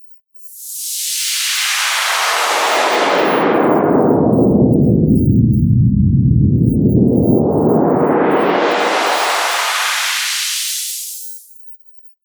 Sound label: Stereo Noise Sweep; Innominatus